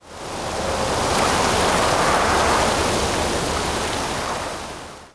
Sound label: wind; DAoC